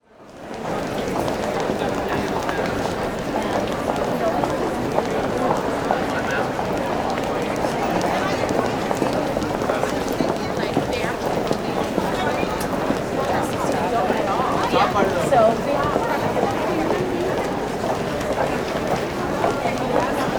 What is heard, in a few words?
Crowd Indoor Pedestrian Concourse; Walla; Sound Ideas; Walla Series